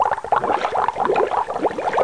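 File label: bubble; RenderOrgan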